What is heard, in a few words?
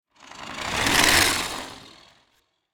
RC truck movement pass by; rc toys